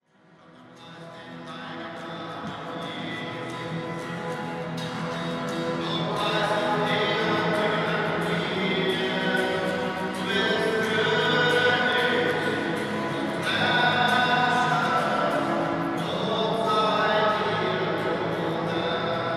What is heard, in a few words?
church song; berlin